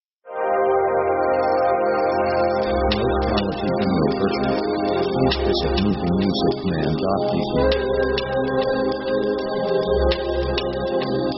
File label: synth cool background; synth themes